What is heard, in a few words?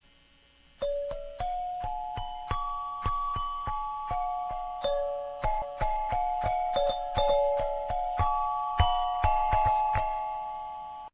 wrench